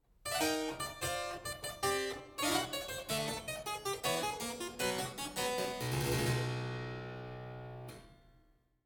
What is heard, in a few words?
jingle